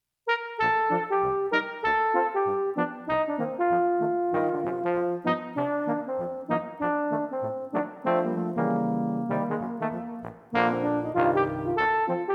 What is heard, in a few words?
4 Trombones; classic jazz; Trombone Quartets, V4; jazz standards for 4 trbns.